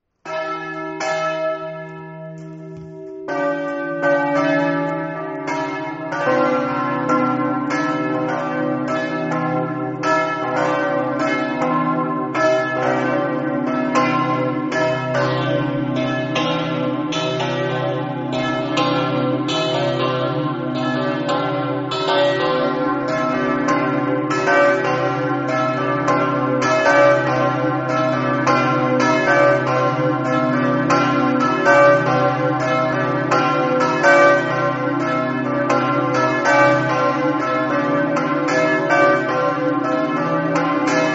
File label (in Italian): campane; muris